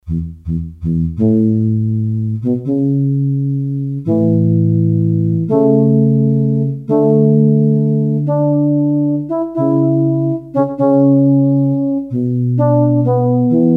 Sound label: French Horn, Korg DS-8; Factory Patches